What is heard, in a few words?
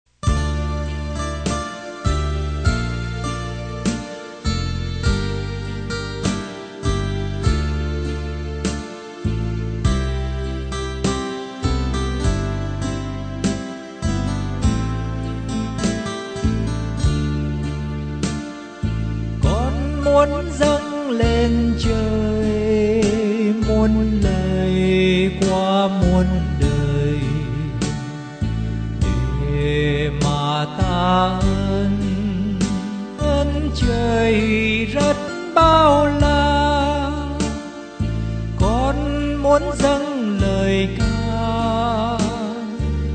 bass